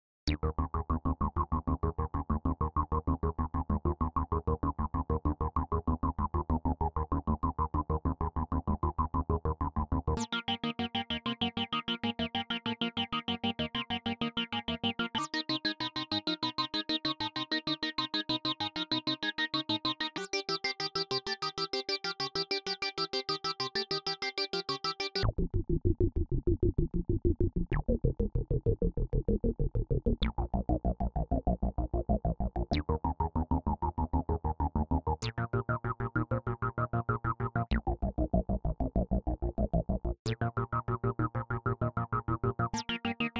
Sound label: Modular V